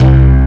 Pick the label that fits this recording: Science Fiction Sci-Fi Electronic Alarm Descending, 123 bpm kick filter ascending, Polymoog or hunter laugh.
Polymoog